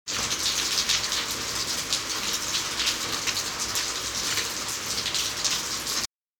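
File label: rain normal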